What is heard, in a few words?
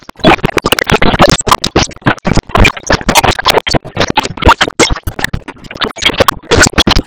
Cannon Shot Wall Explode; Cinematics; Warsongs; Warsong Prologue